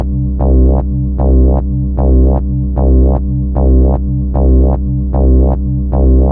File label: 076 two resonance bassline, bass loops